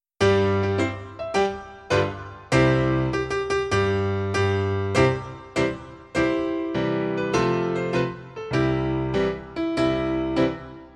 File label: Grand Battement; Pirate Chorus by Gilbert and Sullivan